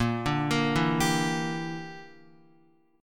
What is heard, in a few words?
A sharp 6th Flat 5th chord, Guitar; A#6b5 arpeggiated; JGuitar